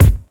clear kick drum one shot g key, Royality free kick single shot tuned to the G note.; kick drum samples